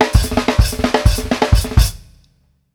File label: HAT, drumworks fills; Sampleheads; New York City Drumworks VOL-1; KD FILLS 120